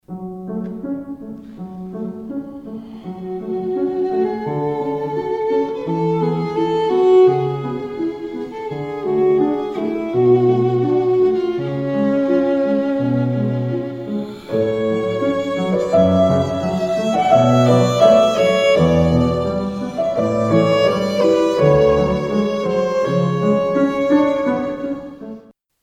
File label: faure pavane viola